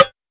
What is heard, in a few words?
sharp acoustic snare c sharp key